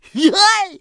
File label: clown throw; Ringling Bros. and Barnum & Bailey; Wii